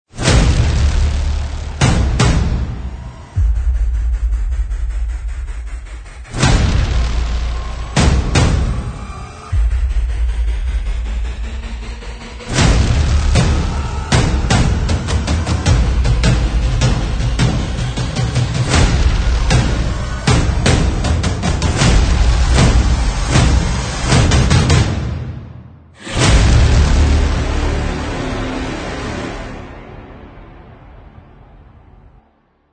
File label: Epic Heroic Ident No Orchestra